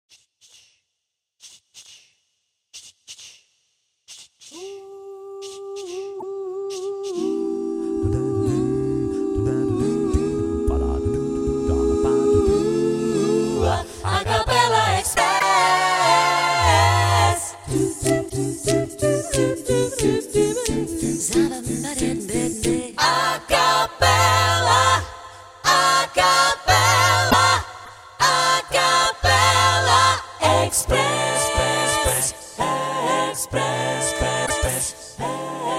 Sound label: A'Cappella